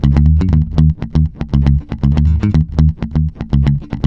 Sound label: D#, groover, bass; Spectrasonics; Bass Legends